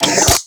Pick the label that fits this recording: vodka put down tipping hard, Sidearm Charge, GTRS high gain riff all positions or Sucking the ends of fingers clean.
Sidearm Charge